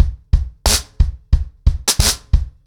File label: 90BPM, ACOUSTIC DRUMS; Sonokinetic - The Roots Drumloops